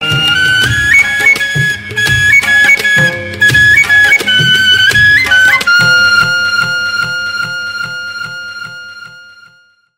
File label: flute tone; ringtones